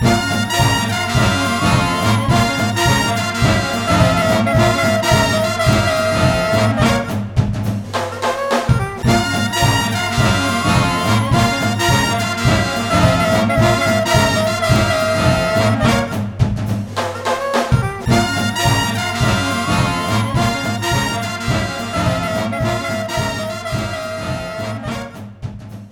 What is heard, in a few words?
Character Unlocked!; tom and jerry in war of the whiskers